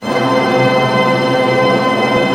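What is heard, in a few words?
tremolo F#, orchestra; Sound On Sound; Peter Siedleczek's Orchestra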